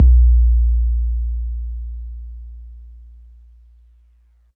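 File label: bass drum 808 MPC60 Nice A#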